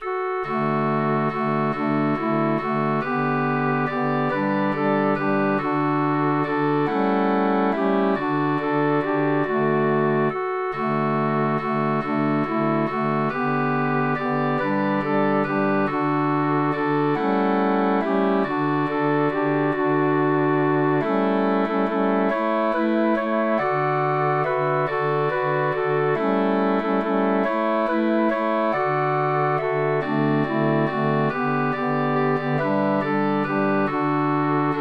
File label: Angel Band Melody Harmony